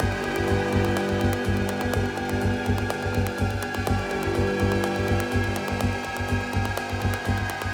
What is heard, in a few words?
Music Losing Loops; Battlefield 4